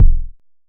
analog deep, Kickbank

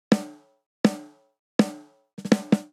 Energetic Pop Snare Only 160 bpm; 4 4; MIDI Clips; Ableton; Factory Packs; Drum Booth

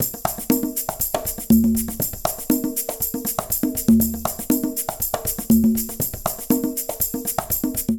Jazz Funk B 120bpm, Latin Percussion; Ableton; Factory Packs

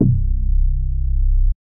Madre Bass; Synth

